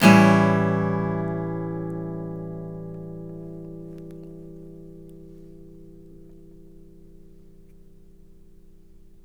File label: CHORDS, Blues Guitar; ILIO; Fretworks